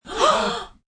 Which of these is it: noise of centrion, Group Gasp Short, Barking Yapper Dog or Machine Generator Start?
Group Gasp Short